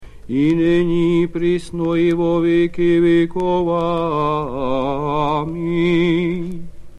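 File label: Tone 7 Stichera; Papp Irmologion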